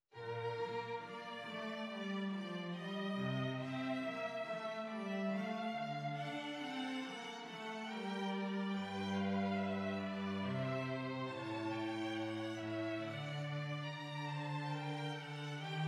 Andante Per Due Violini e Violoncello

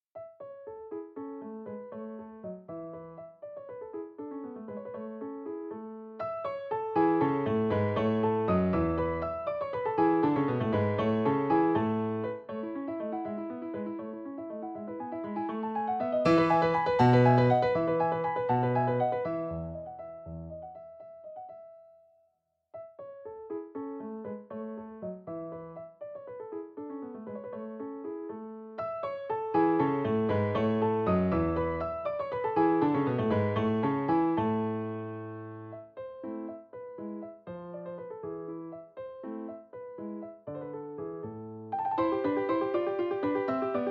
Rondo per fortepiano. Vivace; Weber

